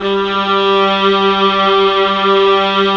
viola, 3-D pads; E-MU Producer Series Vol. 5; 3-D Audio Collection; 3D Multis

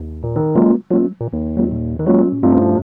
170 Ebmin7; Keyboards; Greek Island; Drum & Bass; Total Drum&Bass

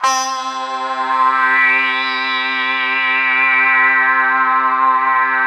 PAD -L, Drum & Bass, 160 BPM; Ueberschall; Drum & Bass Resonance

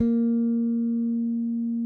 SPEC SUSTAIN, Bass; A#3; Sampleheads; Will Lee Bass Library

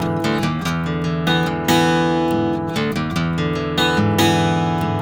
96, Blues Guitar RIFF

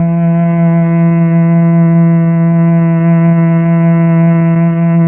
STR, Melo. Cello, Slow Cello; Roland LCDP09 Keys of the 60s and 70s 1

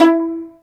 pizzicato E3

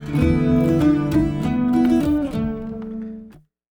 Bouz Down Thmp, Acoustic String; Sonic Foundry (Sony Creative Software); Friendly Fire

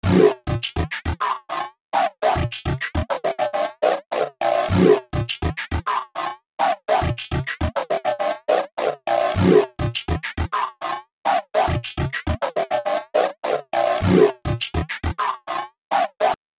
Keyboard; Sonic Foundry (Sony Creative Software); Infinite Soul VOL-1

